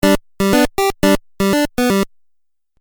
Musical Phrase Video Game

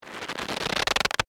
Crunch Corn Starch, Bag; Texture, Crunch, Slow; BlastWave

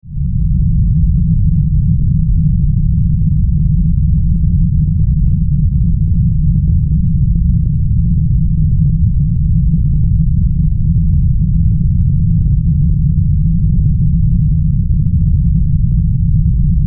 Weather Earthquake Rumble Short; Sound Design; SFX Bible

